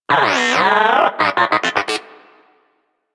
Moa Inquisitive Vocal Disagree; Warframe

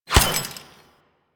Glass Shatter Damage Hit; DE; Warframe

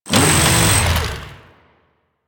Saw Melee Swing Long; Weapons; Warframe; Grn Ghoul Saw Player

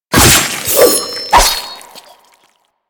Dagger Stealth Hit; Warframe; Finishers; Stealth Hits

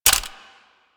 Gunblade Mech, Weapons

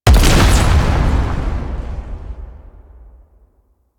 Delivery Key Explode; Warframe; Nineteeen Ninety Nine; PvPvE

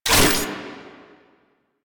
Shotgun Reload; Warframe; Pax Duviricus

